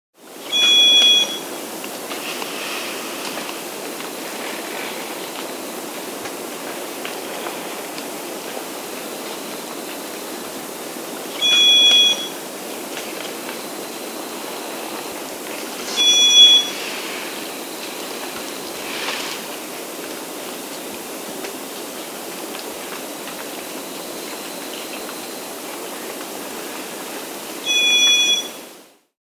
White-Cheeked Solitaire (Entomodestes Leucotis), Andean Birds; S. America